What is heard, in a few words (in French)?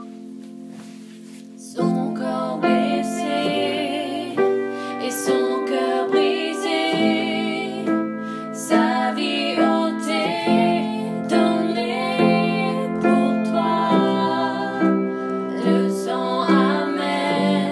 Voix alto